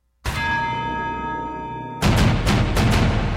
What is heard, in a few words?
terminator alert